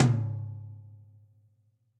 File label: Tom Mid Classic Muffle, Drum Hits; Standard Hits; Ableton; Factory Packs; Session Drums Studio